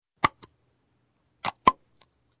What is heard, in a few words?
Spray Can Remove And Replace Lid From Spray Can; Sound Ideas 2000 Series